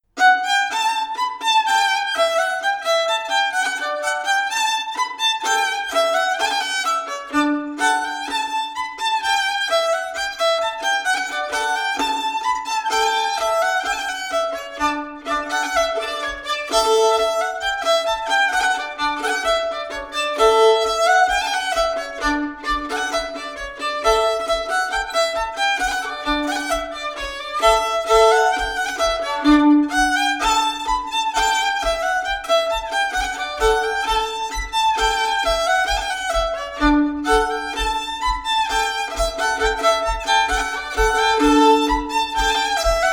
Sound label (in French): Polka